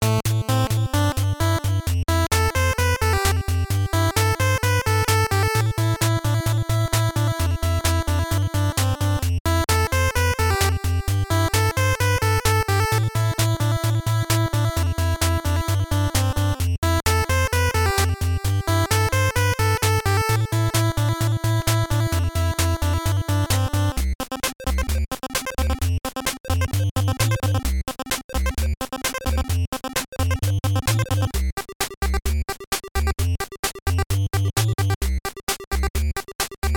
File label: Slam Dunk Contest; Jordan Vs Bird